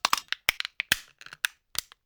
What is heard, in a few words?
plastic handling